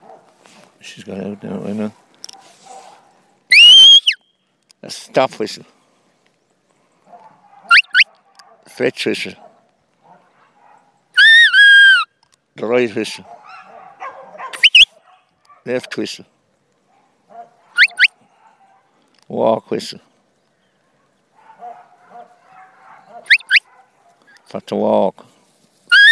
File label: one man and his whistle